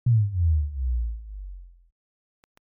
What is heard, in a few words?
Bass Drop; Remix Sample Pack